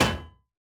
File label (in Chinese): 沉重核心 破坏声